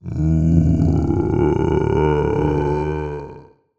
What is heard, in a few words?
Humanoid Zombie; The Decline Of Warriors; Monster Sounds Volume II